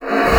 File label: AIR, BASSOON; Xsample VOL-6